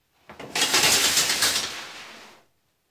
Household Phones

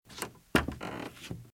Footsteps - Wood - Creaky - Shuffle; RadwinIsland

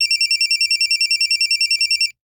Cell Phone Ring; Communications; 1001 Sound Effects

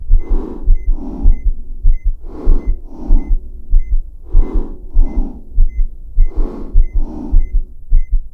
hearbeat Breath Intense; Apotheorasis

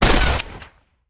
Rifle; Sound Ideas; Guns

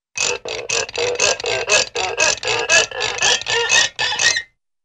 Ascending Short Metallic Clicks & Creaks, cartoon; Hollywood Edge; Cartoon Trax

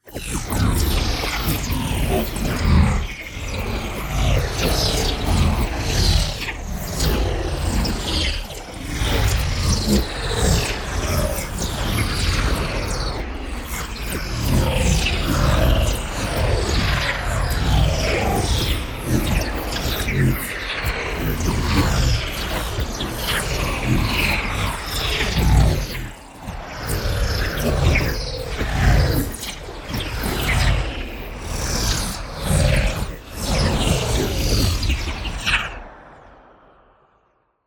PARTICLES LARGE FAST Arcane Sphere; NEON; boom library

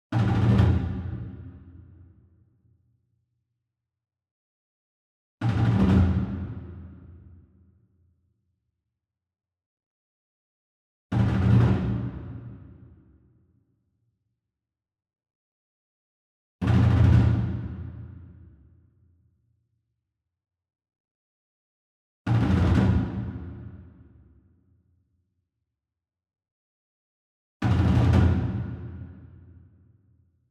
ENSEMBLE DRUM Roll Far; boom library; Cinematic Strikes Construction Kit

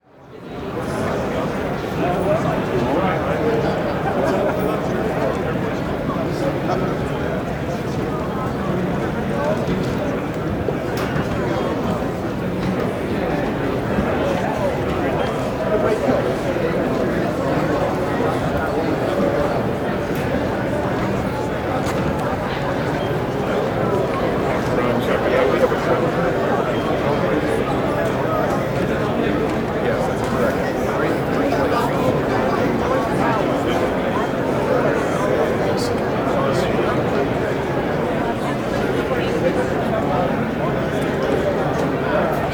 Lobby Busy Convention Crowd Hotel; crowd walla; Sound Ideas; Walla Series

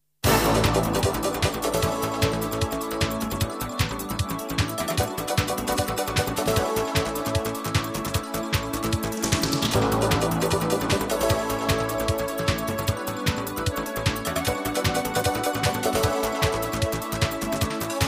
Jingles; 90 DDD Jingles

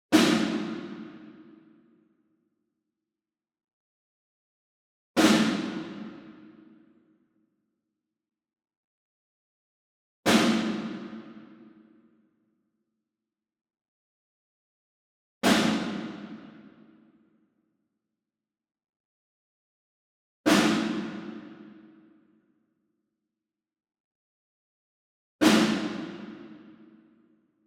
ENSEMBLE SNARE Group Hit Far; boom library; Cinematic Strikes Construction Kit

